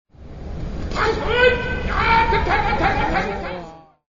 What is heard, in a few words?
hakkeyoi